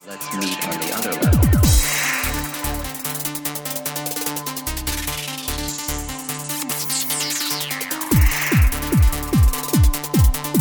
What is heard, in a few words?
Alien Disco